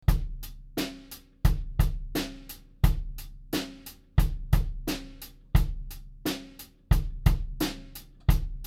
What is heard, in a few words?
kick soft 80cm